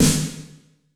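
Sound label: MIRROR Snare; Masterbits - Soniq Elements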